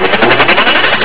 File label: REPAIR